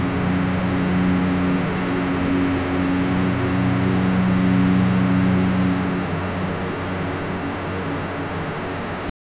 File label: realistic drone propeller; reflections